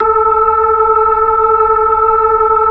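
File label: B-3, Hammond B-3 organ; Roland LCDP10 Keys of the 60s and 70s 2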